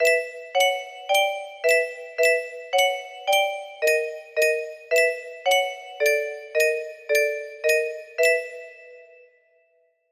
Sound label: music box version; five nights at ottos